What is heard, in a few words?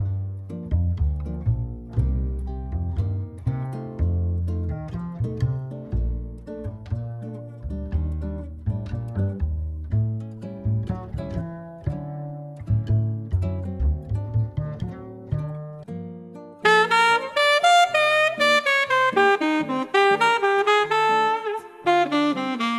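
music for cats, kitten sounds; Muzyka dlya koshek; Zvuki Kotyata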